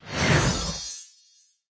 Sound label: Clicker game - Snowman reset Sfx